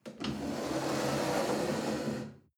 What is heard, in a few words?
chair wood slide on floor soft